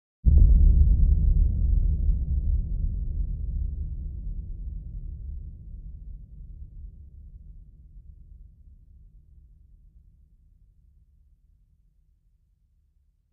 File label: Blast Rumble; blasts